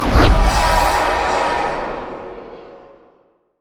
passive dead souls; LoL; thresh

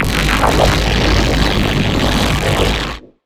beam edge; LoL; velkoz

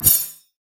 passive warning; LoL; evelynn

